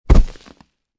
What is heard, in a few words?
Heavy Object Drop Gravel; Thud Sound Effects; LynneMusic